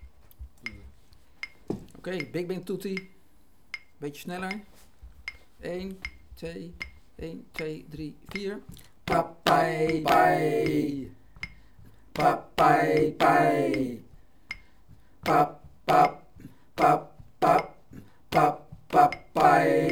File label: bigband tutti